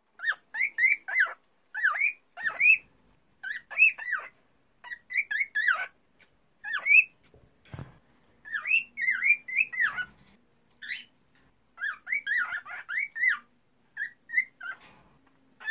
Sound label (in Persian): صدای آواز خواندن عروس هلندی ماده